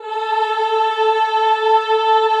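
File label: Choir A3, Ehh; Eeh + Ehh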